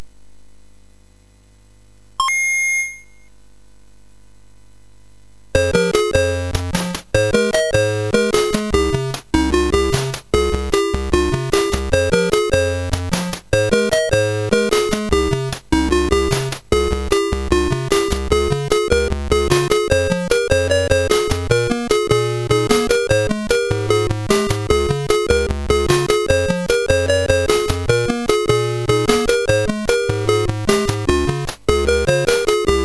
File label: Gameboy Tune, sid MOS6581R4